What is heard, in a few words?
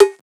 Cowbell Uppermost; One Shots; Drums; Ableton Live 12 Lite; Core Library